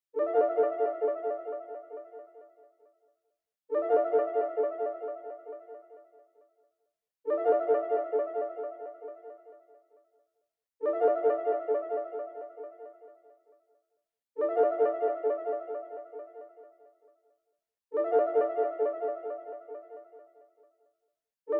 135 BPM D Min Arp; Melody; Loop Stems; Cymatics; 2022 Melody USB Expansion; Axis

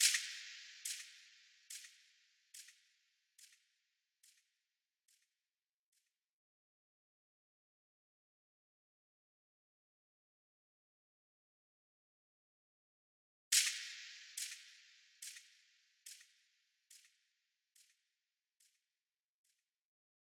142 BPM F Min Impact, Drill; Loop Stems; Cymatics; Bastille

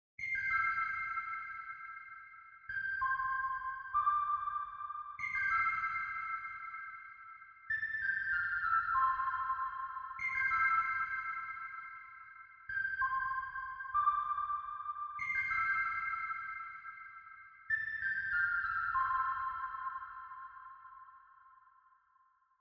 96 BPM A Min Lead; Melody; Loop Stems; Cymatics; Infinity Melody Collection (Preview); Rooftops

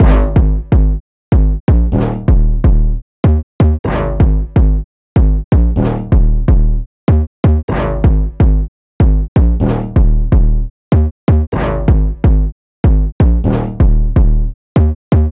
125 BPM Bass, D# Min; Melody Loops; Loop Stems; Cymatics; Fever Dream

